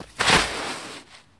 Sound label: Baseball Dive Dirt